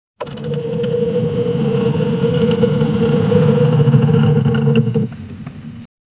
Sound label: the sound of a deflated, tire